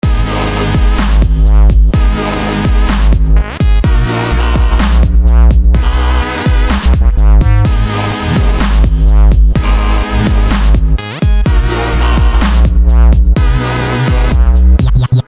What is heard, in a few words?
Driving; Drive or Die 3